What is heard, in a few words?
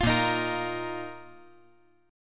Bb Suspended 4th Bb down, chord; Bbsus4 strummed